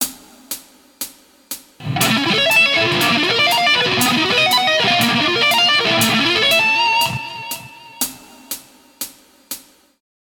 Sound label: Lickbag, Guitar; Guitar Techniques 2019 Issue 290 January